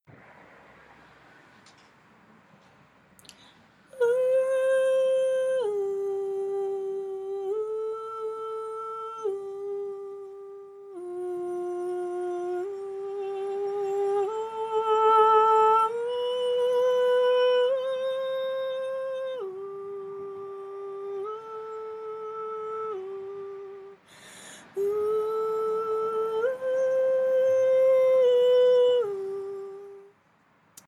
verse with ooh high

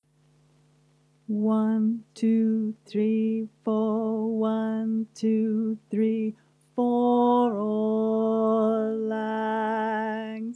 Auld bass chorus, Folk Choir; Auld Lang Syne Resources

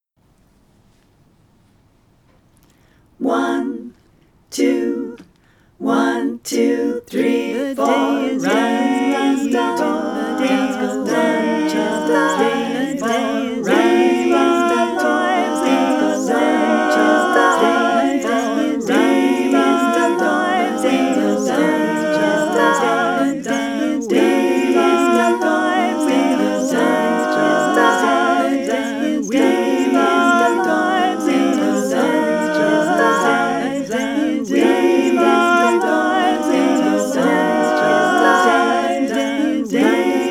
full track

